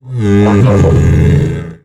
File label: die; Quake 4 Alpha; id software; strogg; tik